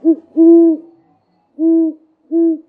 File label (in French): grand duc